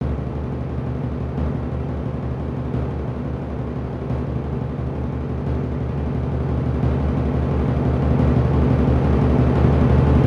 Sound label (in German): zirkusmusik paukenwirbel und tusch